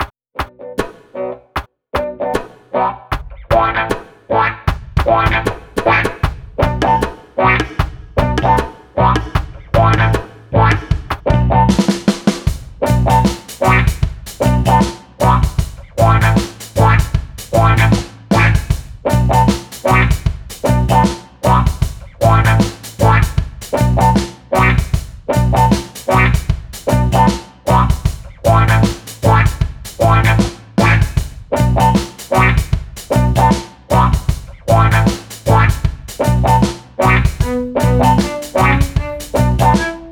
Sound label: reggae